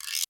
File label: Percussian, Yamaha RY20